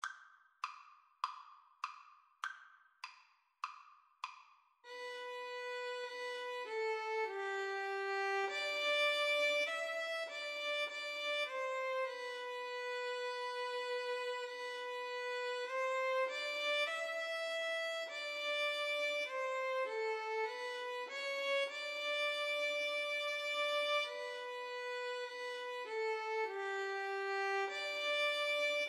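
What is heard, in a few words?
abide with me violin guitar duet karaoke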